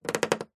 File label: household door wooden creak short